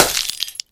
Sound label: bulb pop; video games; train; source engine; portal2